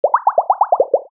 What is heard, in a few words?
bubbles